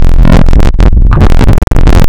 AKWP distorted, wavetable; Adventure Kid

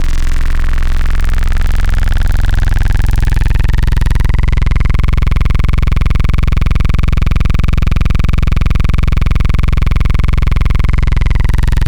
Growl, Wavetables

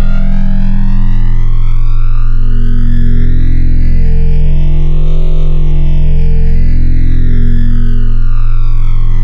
Hyper, Wavetables

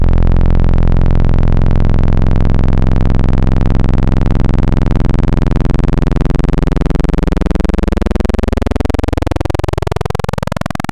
Analog PWM Custom, wavetable

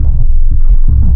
clarinett, wavetable; Adventure Kid

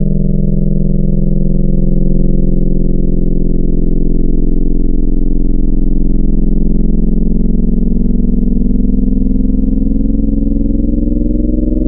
FM, Wavetables

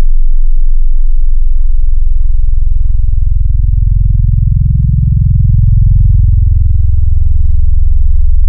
FM, Wavetables

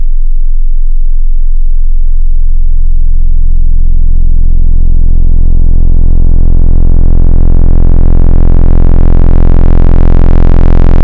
Dist - Diode, Distorted wavetable

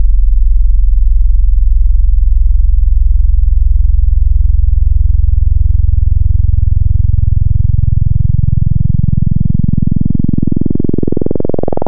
Analog PWM Sub

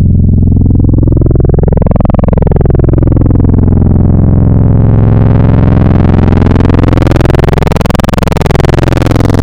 FM, Wavetables

